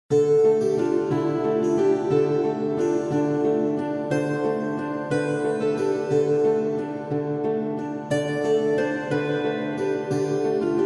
Celtic Fantasy Music Hymn; Ridgeline Building Chain

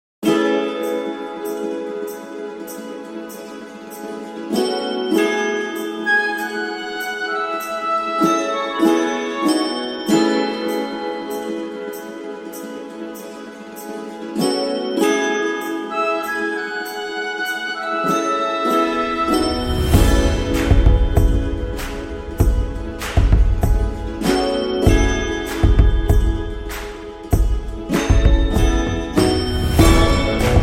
Medieval Bardcore Version

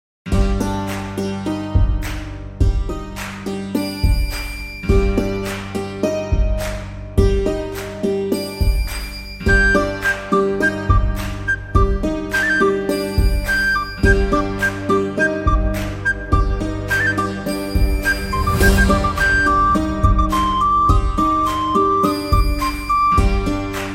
Medieval Bardcore Version

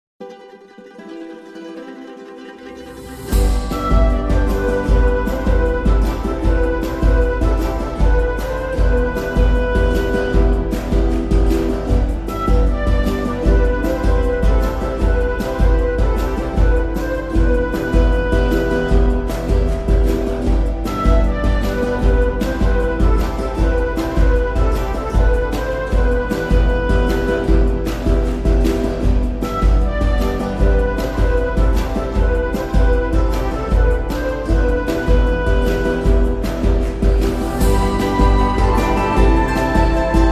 Medieval Bardcore Version

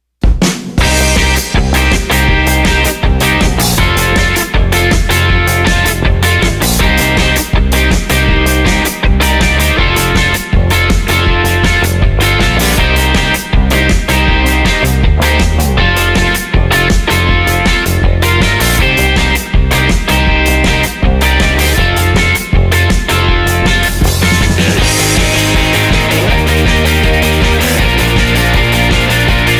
Music FUN Jam Shanty session

